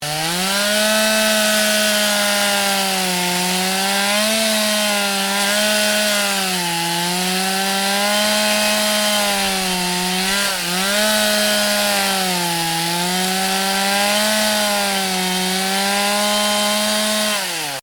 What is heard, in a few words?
Chainsaw: petrol chainsaw cutting at high revs; Machinery; Stuart Duffield